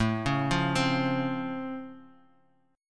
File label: C sharp Augmented Major 7th A, chord